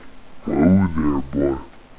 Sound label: critical stop